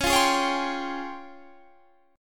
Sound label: Db Major Sharp 11th, Guitar Standard; strummed; JGuitar